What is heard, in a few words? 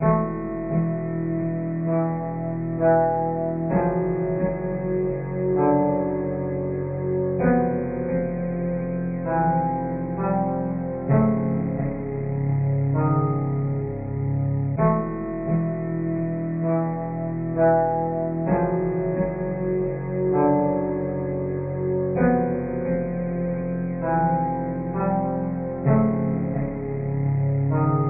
Question Mark - Cold Ancient Cosmic Metal Chord